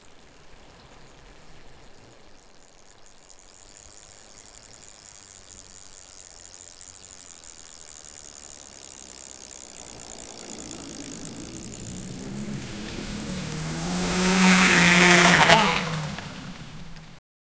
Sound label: seconds of realistic, rocks falling